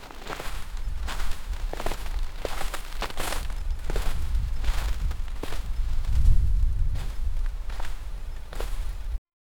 muffled footsteps in snow

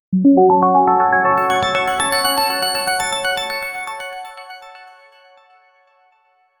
a modern operating system

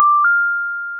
ding for correct answers

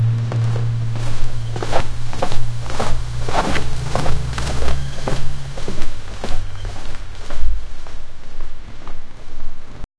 parkour sliding

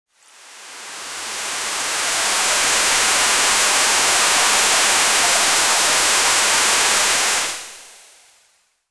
powerful waterfall; depth

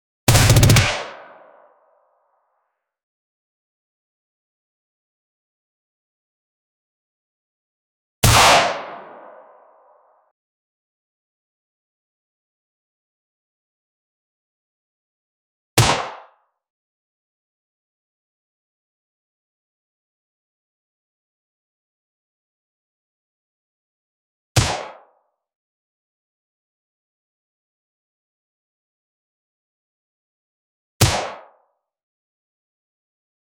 gun shoot 1 bullet

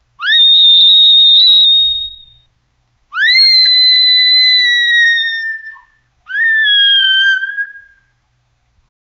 a paniced little kid scream; sound effect

